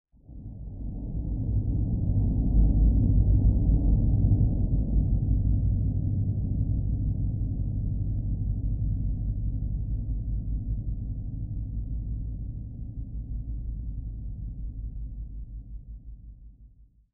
SPACE SHIPS; SpaceSounds

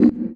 One Shot, Hits

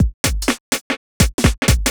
HOUSE BEATS 125

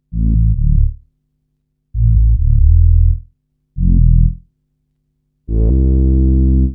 SNTH BASS TEKNO 140 A

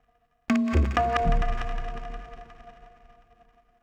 PERCUSSN ETHNO 125, Drum

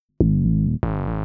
TECHNO BASS 140 A

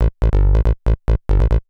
TECHNO BASS 140 A

